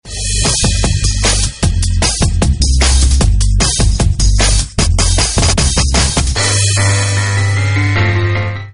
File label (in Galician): musica